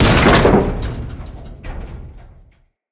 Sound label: Ship Hull Impact Medium; Vehicles; Hit Effects; RailJack